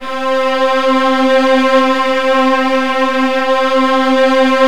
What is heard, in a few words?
Virtuoso Strings